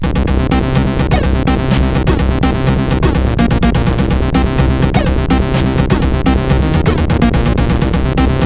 Unused Shmup Ready Game Over; CSG8580R5

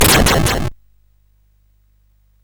sid CSG8580R5

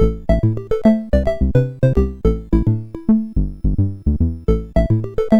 sid MOS6581R4; Experiment 004

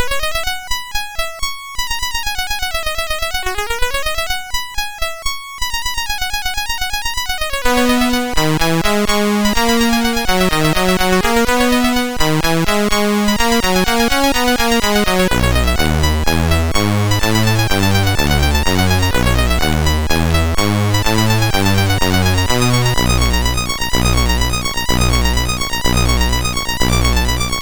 MOS6581R4, sid

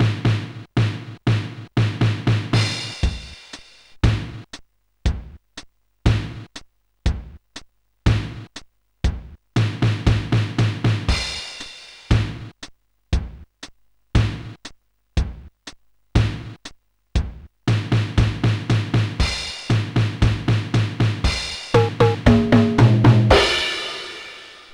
Beat, Sonic Drum Kit

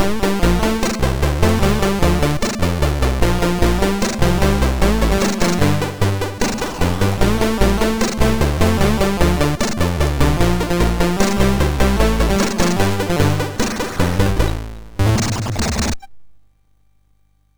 MOS6581R2, sid; Defenders of the Earth

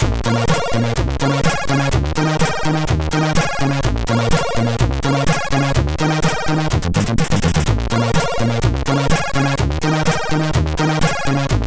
Intro, MOS6581; Starion; Zenox; MOS6581R4

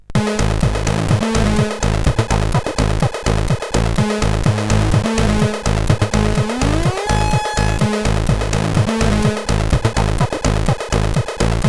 intro, sid CSG8580R5; PCX Show 1994

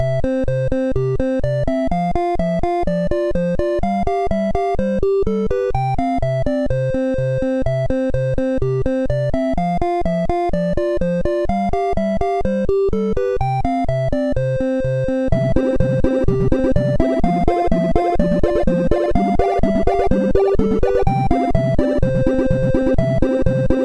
Croaky; MOS6581R2

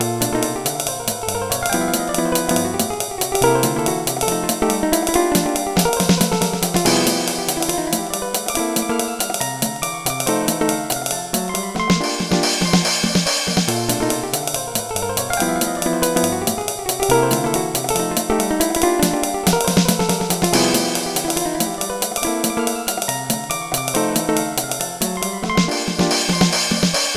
solo; Dr. T's MIDI Recording Studio

